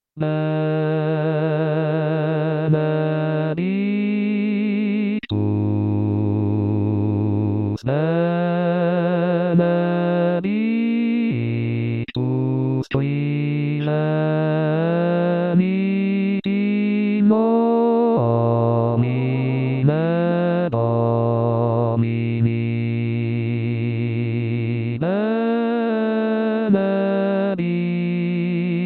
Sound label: Benedictus bassi; Perosi; Pasqua